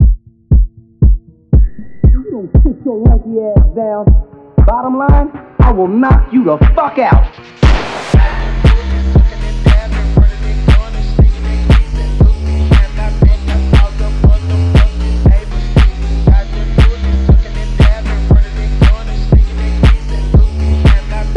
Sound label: 8D Phonk